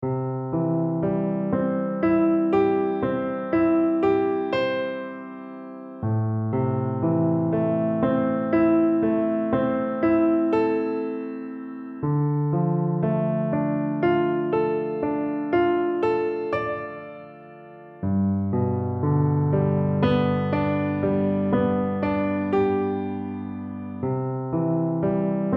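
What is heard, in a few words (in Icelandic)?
ballada sóló, piano; piano leikur eldri nem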